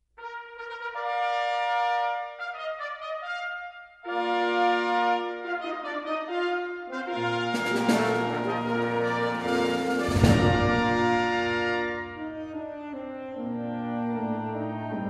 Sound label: fanfare